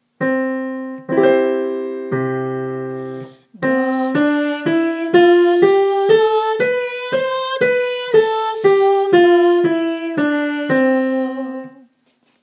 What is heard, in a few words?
Major Scale Example